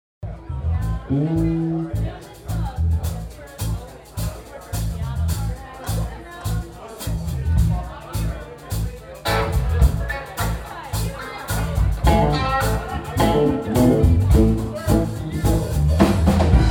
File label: Viola